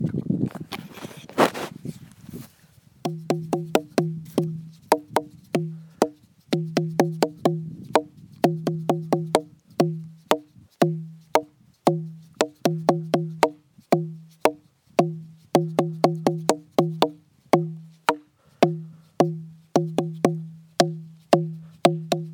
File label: log drumming